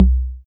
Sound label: Tom Hi, Alesis DM5